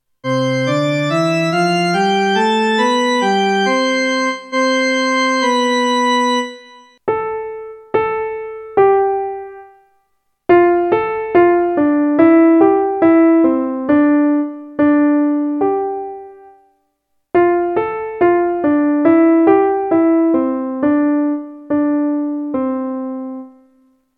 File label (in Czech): Varhany Klavir